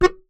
Melodica Short Note

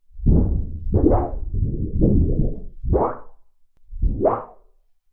Storage Heater

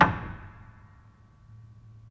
Hamm Perc, Piano Hammer Perc; Piano Miscellaneous

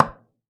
Golf Ball Single

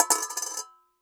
Coin On Collander

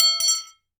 Aluminium Tube Drop

